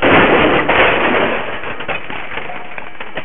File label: Crash; avanim